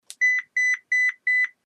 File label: BEEPS